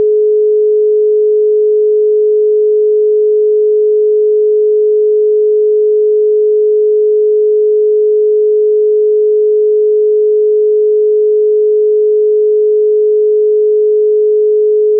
dial; Nokia Lumia-1320; Lumia 1320 Southeast Asia

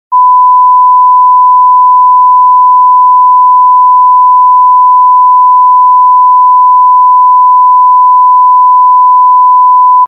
1kHz; Samsung SCH-M490 T Omnia

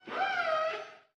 Arctic Animals; Xiaomi Redmi-Note-8-Pro

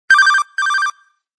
Move Bell; LG LG9600 Versa

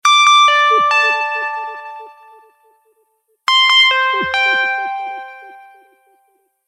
Ring; Samsung; SPH-M800 Instinct

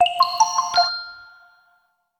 Aqua Blue; LG V31 isai VL

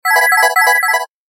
Serene tone; Samsung; SGH-D780; Southeast Asia

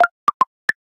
Stepping Stone, notifications; LG Wing 5G Verizon

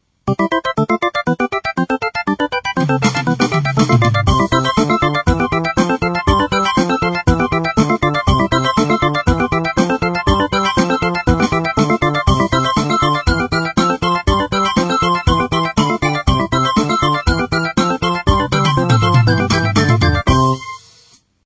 Ring Mix; Asus P526

